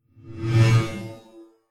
Whoosh SciFi Saber Slash; Light Sabers

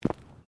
FOOTSTEPS FOOTBALL BOOTS CONCRETE STEP; Footstep Foley; Footsteps (Sound Effects:Human)

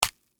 Stab Apple Hit, Texture, Stab, Apple, Hit, Fruit; Food; Household

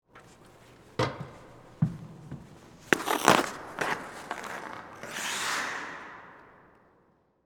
Hockey Bench, Player, Leave, Bench, Enter, Ice; Sports; BlastWave

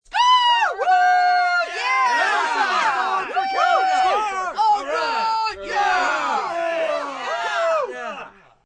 Group Cheer Score, Cheering, yelling, group; Crowd Sound Effects

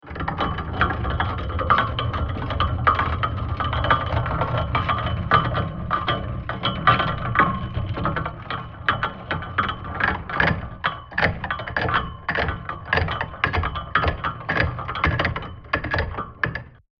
Draw Bridge Chain Metallic Clanking; Medieval; Creaking; Creaking (Sound Effects:Objects)

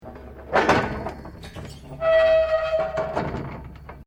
METAL PIVOT LEVER IMPACT SQUEAK; Mechanical actions; Materials

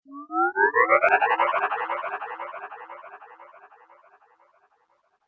Time Warp, sci fi

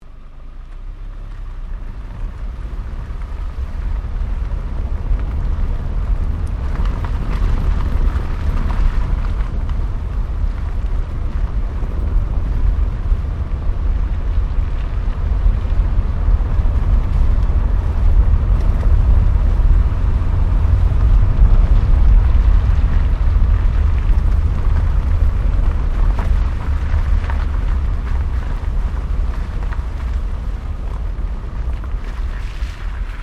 Car Toyota Starlet driving start stop; Toyota hire car in Japan; Cars (Sound Effects:Vehicles)

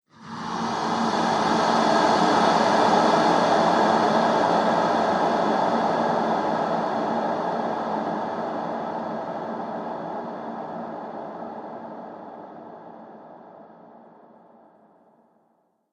Zombie Ambience Drone; horror; Zomby

